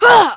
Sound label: DMG